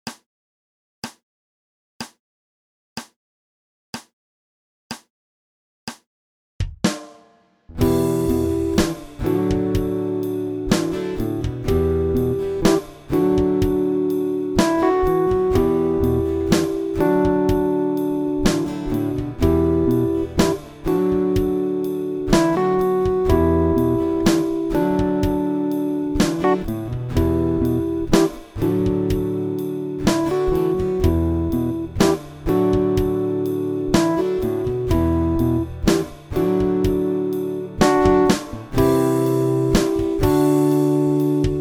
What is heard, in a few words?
dogs dry solo backing track